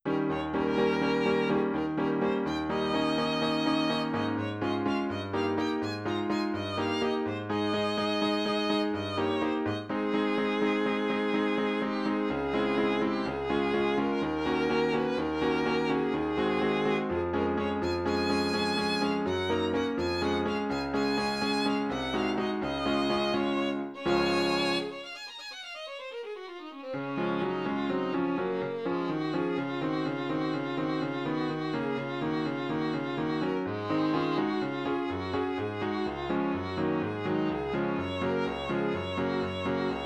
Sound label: violin in db